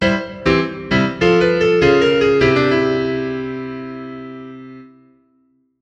Game over; Super Mario Bros.

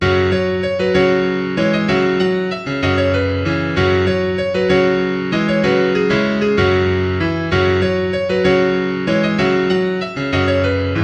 Tavern Intro Cue

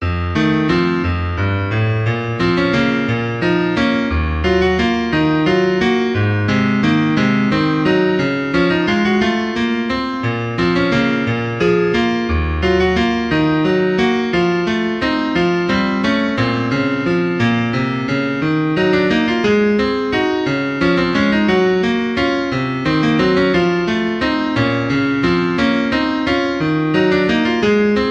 Legend Wind

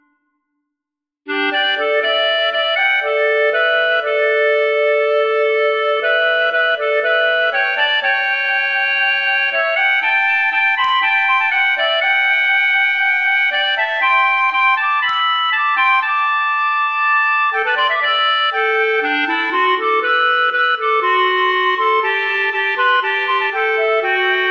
woodwind

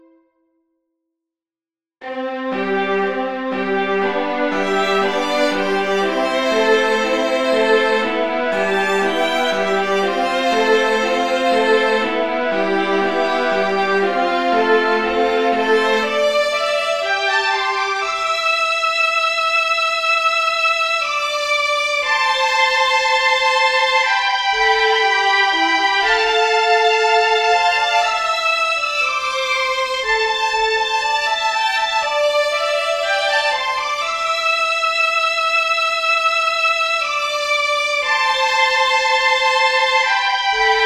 strings

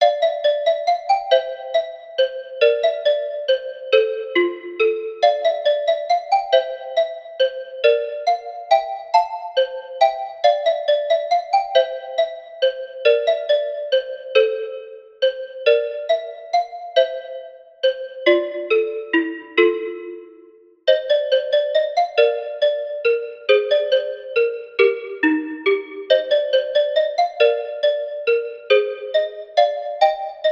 Melody

